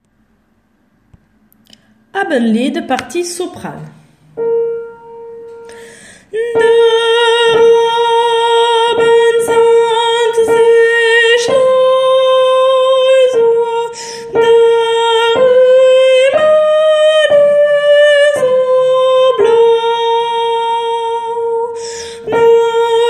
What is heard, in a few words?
Abendlied Soprano